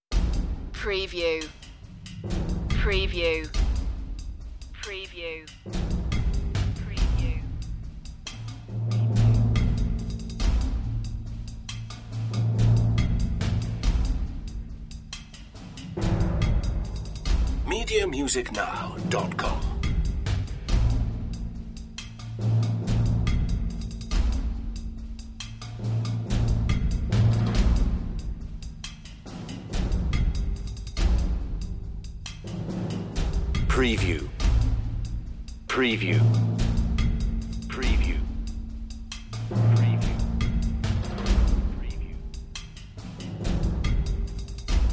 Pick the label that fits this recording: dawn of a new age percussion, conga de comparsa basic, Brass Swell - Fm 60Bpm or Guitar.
dawn of a new age percussion